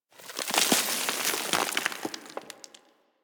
Generic Explosion Rocks Medium; Warframe; Explosions; Surface Hit Effects

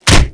Brawl Punch C; Warframe; Brawling

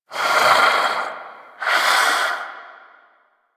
Vocal Breathe; Warframe; Duviri; Dax; Vocal Set A

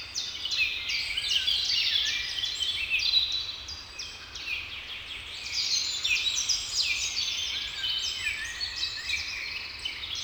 Wetland Forest Day Ambience Loop

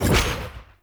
Wolf Claw Melee Swing; Powers; Orengall

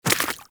Small Walk Water, Footsteps; Warframe

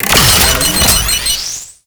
Mine Cap Open; Tau; Twelve Minute War

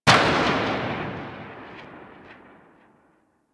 Sundial SMG Tail, weapons; Warframe; Tenno

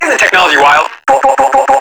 Proto Infested Ancient Tentacle Swing; Warframe; Abilities

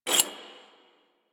Glyph Eye Close; Warframe; Duviri; Glyph Puzzle

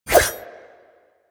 Kunai Whoosh

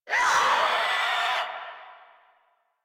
Infested Crawler Attack Short; Warframe

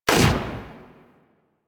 SMG Shot, weapons; Protea

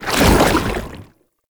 Goo Layer, Footsteps; Warframe; Lasrian; Lasrian Soldiers; Foley; Lasrian Chem Strike Nox

